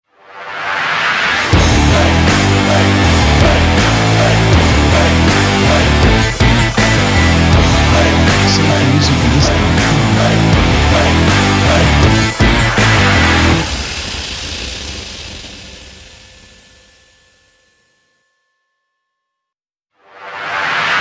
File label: Action And Sport Rock Intros; SafeMusicList